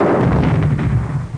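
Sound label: explosion big; America Invades! Demo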